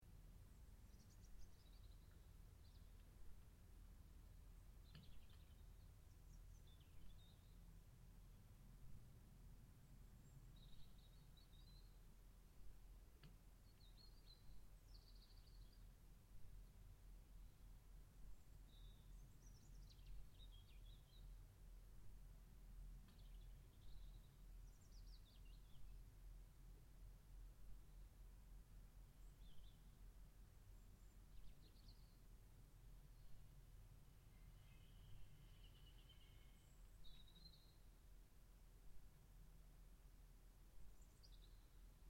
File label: morning bird night